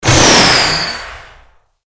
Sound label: superjump